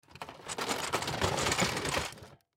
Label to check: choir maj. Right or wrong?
Wrong